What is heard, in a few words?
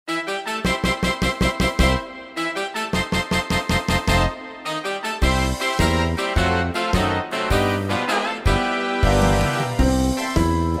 Keyboard jogging Boogie, piano; pistas-piano